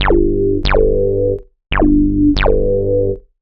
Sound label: ELECTRO BASS 140 A